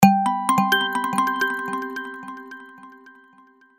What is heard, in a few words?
notification; Coolpad